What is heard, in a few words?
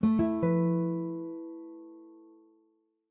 Guitar, notifications; Samsung Galaxy-S26-Ultra; Calm